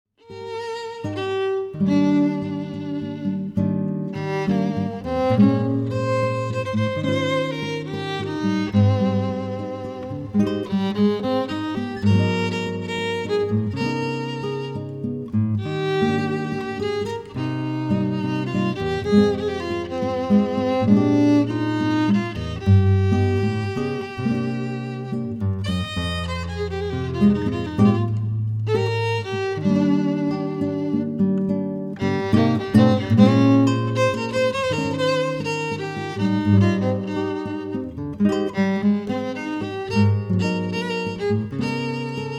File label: guitar violin